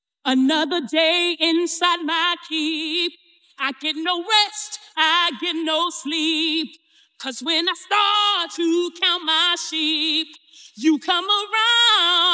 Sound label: Vocal Only; Shrek; Audition Cuts; Dragon